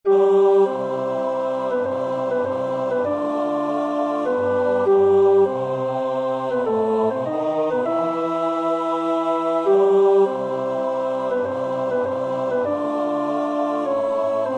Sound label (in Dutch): tenor 2, koorpartij oefening; Schubert (Franz); Vier Gesänge für vier Männerstimmen, Op. 17